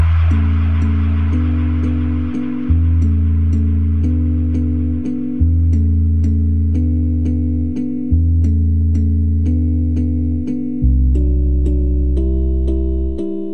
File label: lovely beat